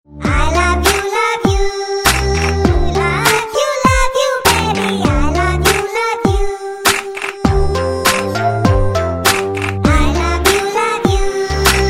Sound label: love u cute ringtone